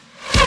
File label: arrow